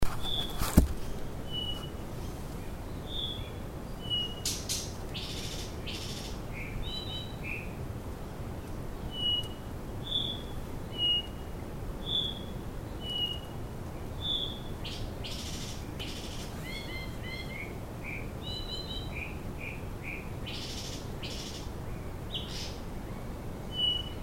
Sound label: flycatcher white tailed Cyornis concretus